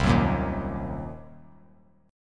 C Minor 7th Flat 5th, chord; Cm7b5 strummed; JGuitar

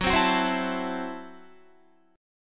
Gb Minor 7th Flat 5th, Guitar; Gbm7b5; strummed; 14,15,16,17,16,16

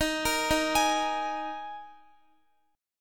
Eb Suspended 4th Eb Guitar 4E 4A 5D 5G 5C 6F; Ebsus4; arpeggiated

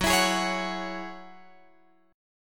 Gb 7th Suspended 2nd Gb down Guitar Open F; Gb7sus2; strummed

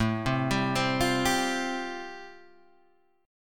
A-Minor 7th Sharp 5th, Guitar Open G, chord

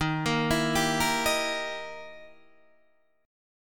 E Minor Major 7th Flat 5th, D sharp

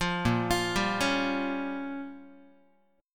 Bb Minor 6th Bb down Guitar; arpeggiated; JGuitar

